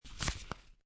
Playing Cards Slide One Card Off Top Of Deck

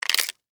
Insect Eat Chew, Bite, Crunch, Bug; Animal; Insects Eating; BlastWave

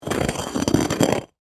Ice Object Drag, Across, Ice; Texture; Dragging (Sound Effects:Objects)

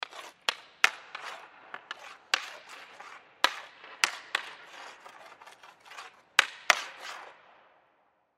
Hockey Stick Work, Hockey Stick Works; SPORTS; SoundEffects-Blastwave

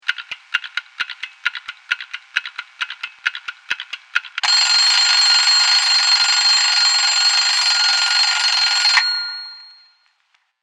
Clock Tick Vintage Alarm; Clock Sound Effects; Clocks (Sound Effects:Machines)

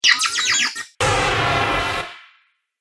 Android Fire Extinguisher, robot; Robot Sound Effects

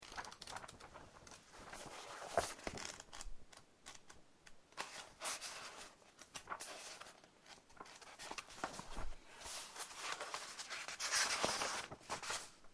Book Read, Turning the pages of a book.; Books; Offices

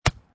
Tennis Ball Hit Net Rim; Sports

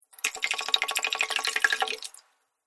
Water Fast Little Bubbles

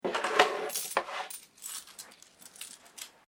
Coins Collected; Common coins; Money; Objects

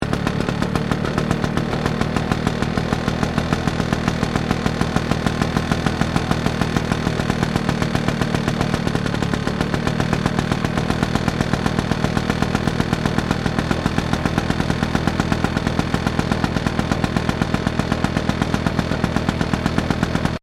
Lawn Mower Victor Idle High Loop, old lawn mower; Lawn Mowers; Machines

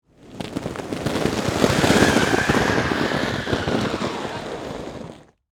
Snow Drag Inner Tube, Across, Snow, Heavy; Weather; Snow Sound Effects; BlastWave

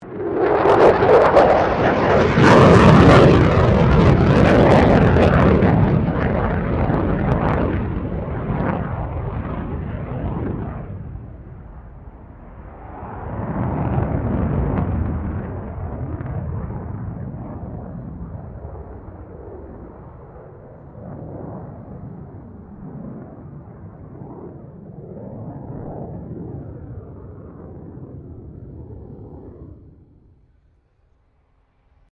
AIRCRAFT F16 FALCON MANEAUVERING

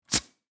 Pneumatic Tool, Industry, Disconnect, From, Hose; Power Tool; BlastWave

